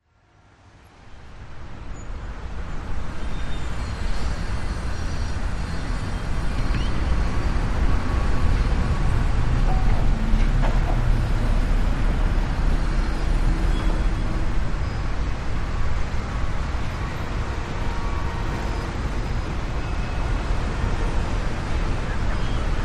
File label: Construction Site Tr, big city